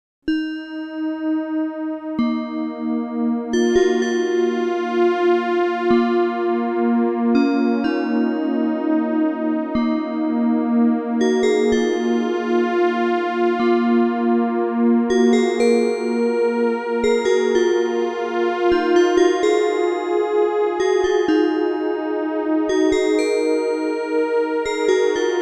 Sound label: dw8000 soft solo